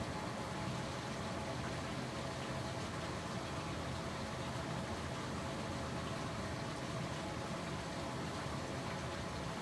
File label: Basement Waterpipes